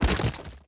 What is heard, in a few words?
dirt break; teardown; ps5 windows xbox series xs